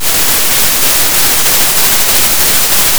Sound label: Sound of a thunderstorm